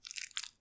浅めにストローク, ピストン運動 激しめ; １ストローク単発

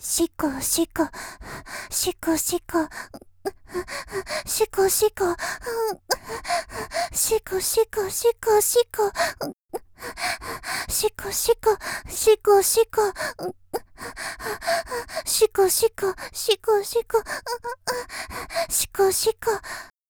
「手コキ声」（シコシコ）と言いながら激しい喘ぎ; 綾波系; 少女

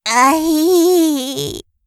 あひぃ～; 失神直前

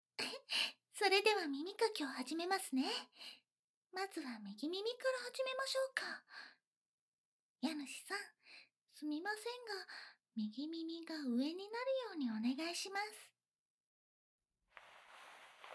右耳の耳かき, ASMR

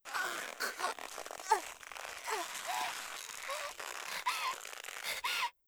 首絞められ

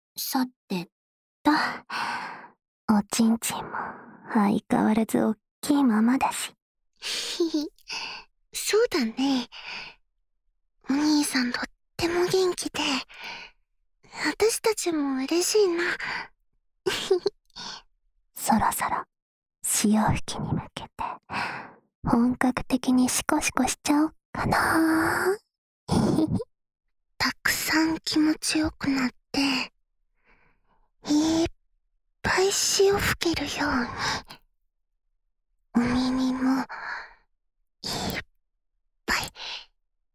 ディープ耳舐め, ASMR; ディープスロート; 射精三度目

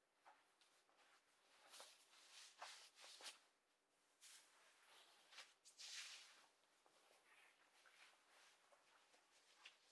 上着を脱ぐ

